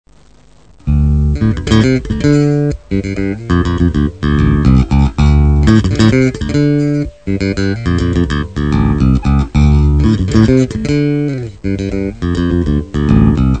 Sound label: Bassgroove